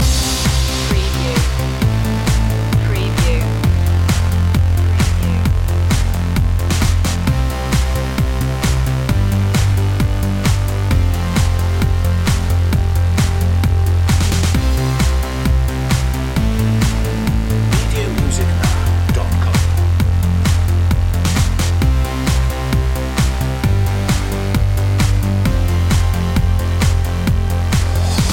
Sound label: dreamwave loop